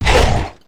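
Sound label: ghost damage, monster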